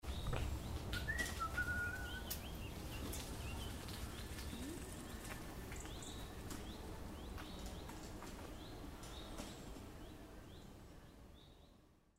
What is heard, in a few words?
Foley